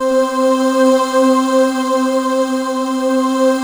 SD1 CHORAL; Best Service; Alpine Volksmusik

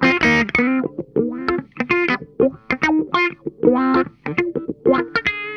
NOTE, Funk Guitar; Zero G - Funk Guitar

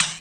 RSS, 160 BPM

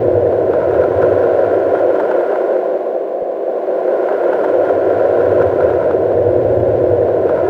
SUBMARINES; Spectrasonic Distorted Reality 1

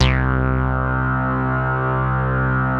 C3, BASS; Q Up Arts; Dream Experience; BASS BANK

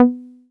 SYN 303, Roland TB-303

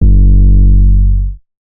Dusty 808 F, hip-hop drum kit; 99Sounds x Monosounds; Moon

